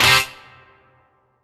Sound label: Dance Mega Synth; Best Service; 90 s Sample CDs